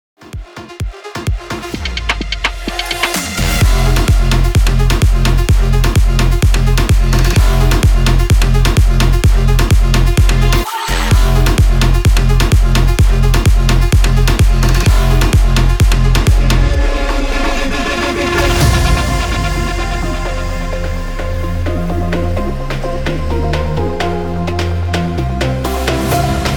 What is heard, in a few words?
BIG SOUND VIP CUT 128 BPM